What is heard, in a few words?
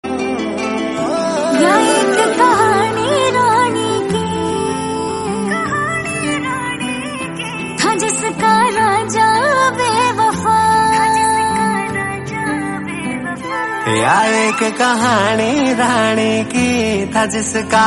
Female